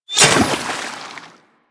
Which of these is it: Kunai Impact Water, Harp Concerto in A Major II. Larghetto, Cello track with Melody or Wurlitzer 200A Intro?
Kunai Impact Water